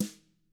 TAMA; ILIO; Double Platinum Drums 1

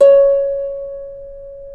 harps C#4; PlatinumPhattCD1; E-MU Producer Series Vol. 8 – Platinum Phatt; Best of Phatt

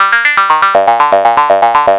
Progressive Synth, Trance

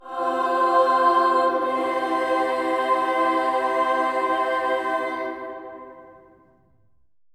amen; Spectrasonics; Symphony of Voices; BC AMENS

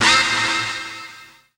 noise, FX & ATMO, ALARMS & ALERTS

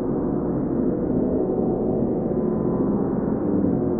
Sample n Hold Verb Loops, sparse soundscape

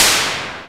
TICTALK DRUM

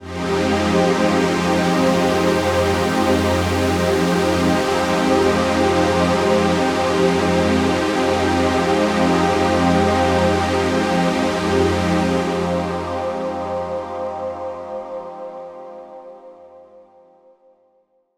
pad sawed F

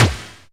Strange Kicks, Percussion; Dance Synths

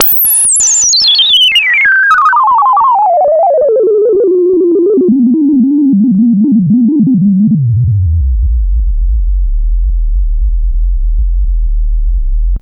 FX SWEEP

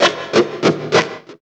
GTR

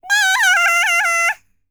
BLACK SEA, World Voices; ILIO; Vocal Planet VOL-5

